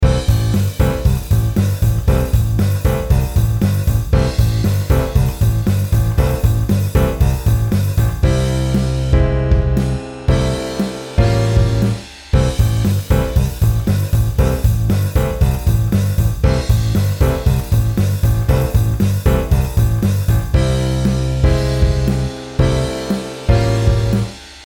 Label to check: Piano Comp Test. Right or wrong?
Right